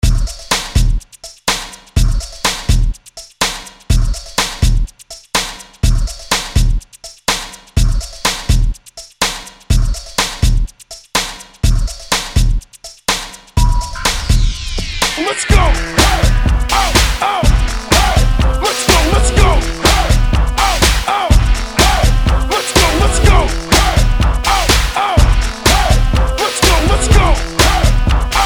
Hype Re Drum 124 Bpm

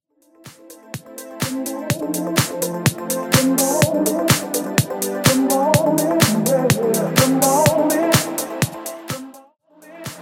Remix, 125 bpm

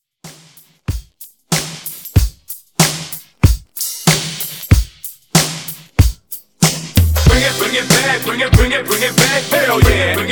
Hype Redrum

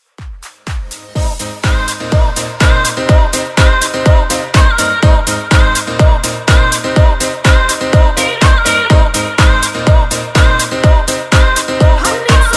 Dance Redrum

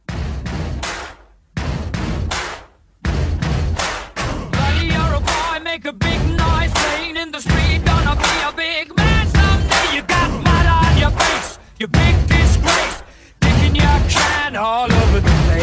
Intro Re Drum, 81; FIFA SOUNDS

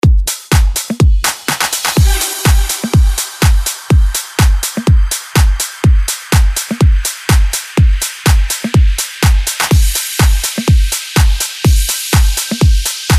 deep house 124 bpm

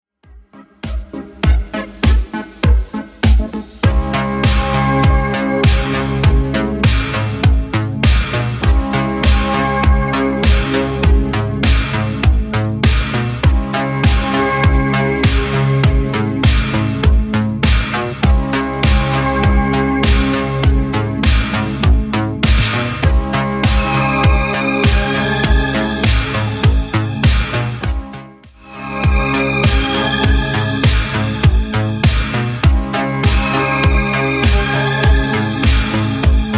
80s Redrum 100bpm

